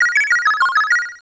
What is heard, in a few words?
8bit